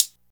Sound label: raw hi hat g sharp, Royality free foot cymbal tuned to the G# note. Loudest frequency: 7480Hz